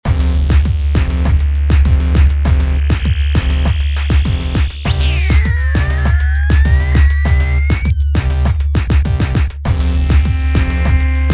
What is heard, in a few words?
Some Lengthy Beat